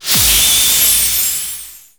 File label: SE RESULT RATING CHANGE; SE RESULT RATING UP; maimai